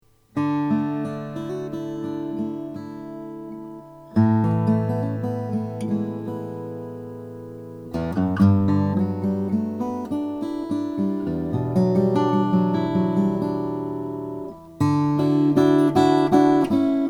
martin 00 17 mahogany; 1950

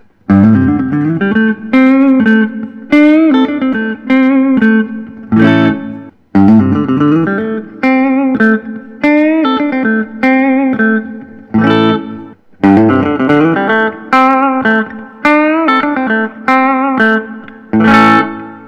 collings i 35 lc; blonde

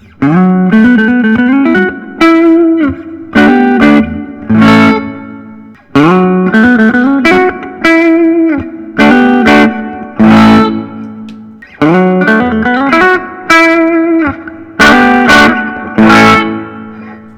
gibson memphis 59 e vintag

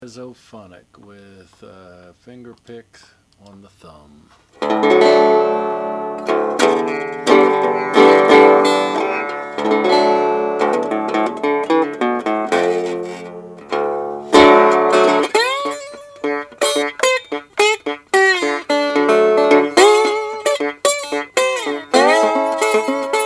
national reso phonic; 1966; pink

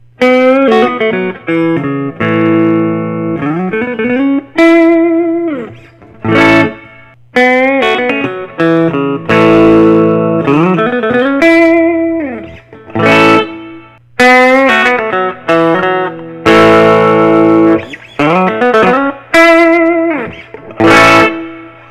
gibson custom 59 es 335 brazilian; nashvi

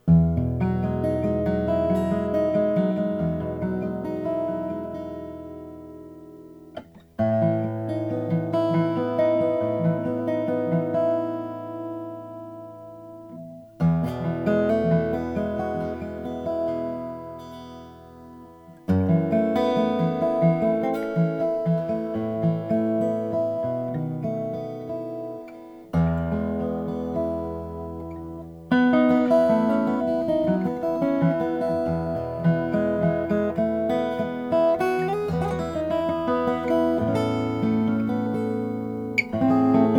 beardsell 3d v macassar ebony

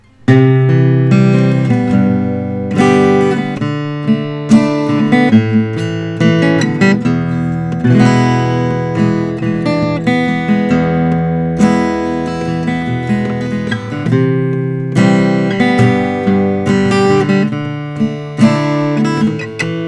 collings 02h t 12 fret

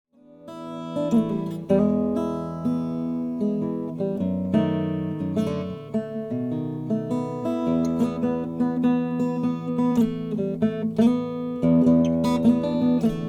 gibson vintage l 0 peanut mahogany

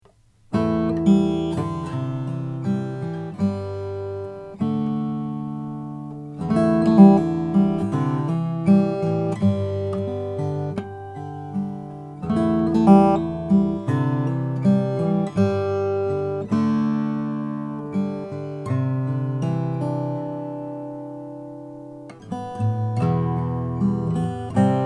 applegate j indian rosewood

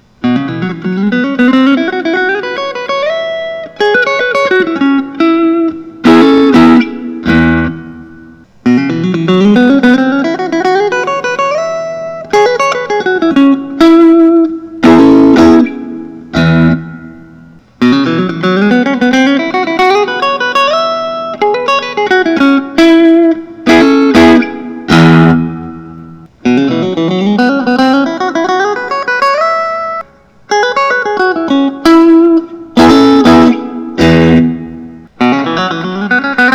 fender custom shop true 62

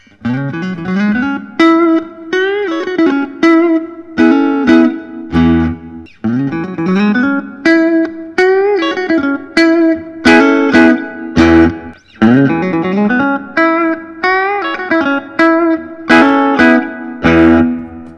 fender custom shop; greg fessler mb

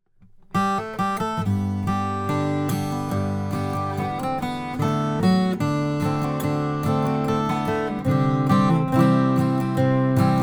rainsong co ws3000 carbon fiber

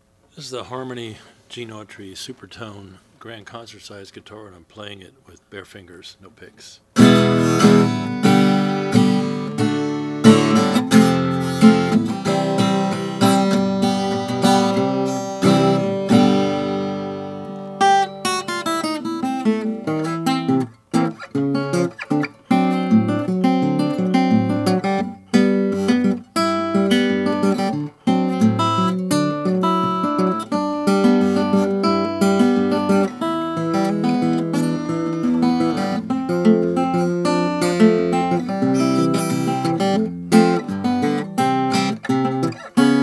harmony supertone; 1940

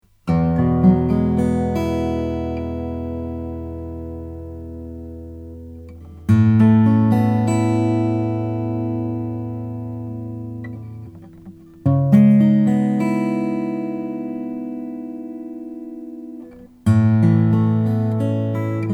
osthoff sjc african blackwood

